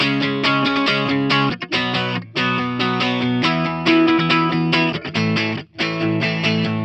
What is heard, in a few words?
Electric Pop Guitar 128BPM C#min; Vengeance - Essential Clubsounds Vol.4